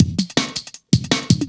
Tinkle Cut, drum loop, jungle, 161.5; Zero-G Jungle Warfare 1, 2 & 3

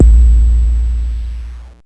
BD, Mutable Instruments Anushri

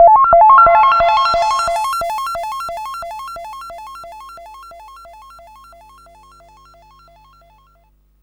FX, Drum & Bass

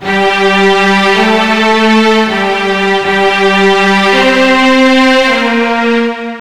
World Service 160 Gmin, Strings; 05 WorldService; Total Drum&Bass

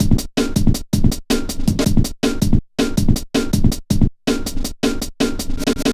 metallic stepz, drum loops; Zero-G Jungle Warfare 1, 2 & 3

